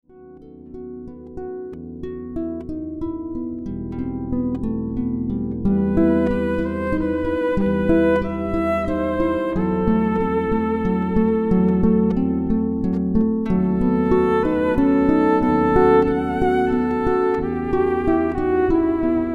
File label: Siciliana Duet, Electro Acoustic Harp Electric Violin